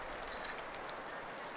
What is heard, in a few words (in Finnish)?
töyhtötiaisen ääni